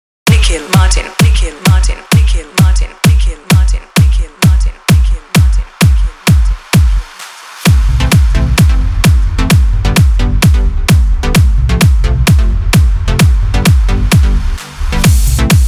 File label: Jingle Bells Indian Style Instrumental